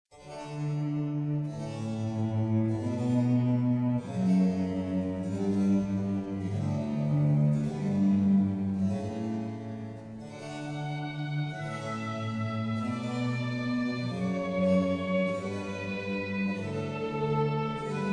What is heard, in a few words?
string quartet